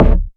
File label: Toms